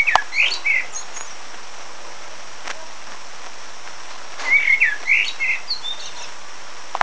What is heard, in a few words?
tweeting; dorset